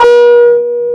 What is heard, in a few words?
ESQ 1 FAT, SYNBASS; Synthesizers; Soli-Music; World of Synthesizers VOL-1